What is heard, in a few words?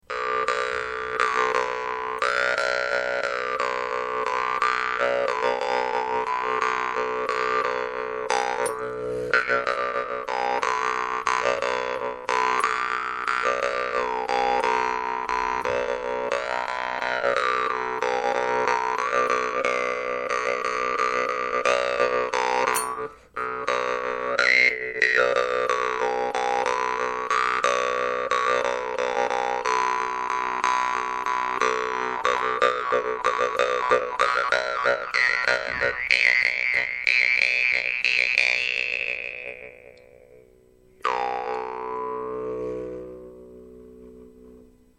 vargan; russian vargan sklemin strela